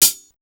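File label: Hi hat, Drum One Shots; AMG - Coldcut's Kleptomania VOL-1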